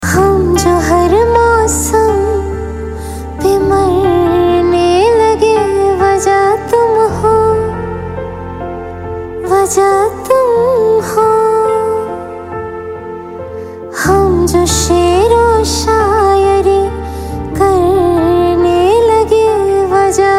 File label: female ringtone